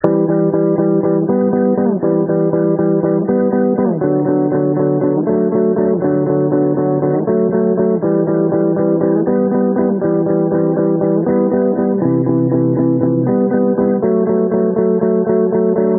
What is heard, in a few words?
electric guitar, shifting